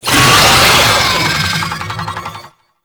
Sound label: Boss Vocal Alerted; Enemies; Vocals; Warframe; Oraxia